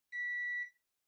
Air Conditioner (Far Beep)

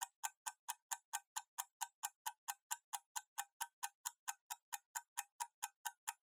Kitchen Timer Tick Loop

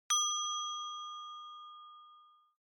Ding High Long; Onoma

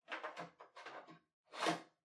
TV Hardware Far Card Plug In

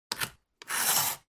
Cutter (Cut-Cardboard)

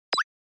Button Click High; Onoma

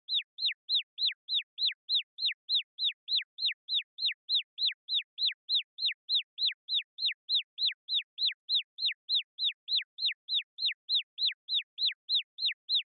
Motion Dizzy Slow Long

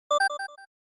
SNES Fighting Select

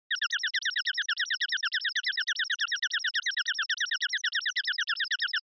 Onoma Panic Low Mid

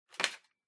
Power Strip Pick Up

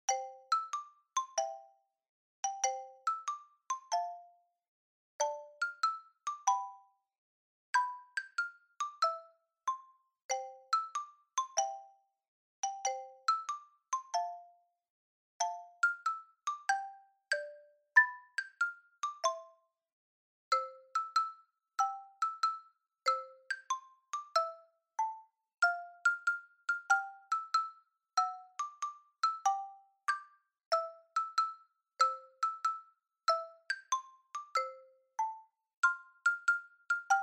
Shuffle; Jingle Bells

